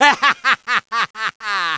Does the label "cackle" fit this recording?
Yes